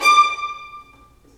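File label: violins marcato, String Sections; Roland LCDP13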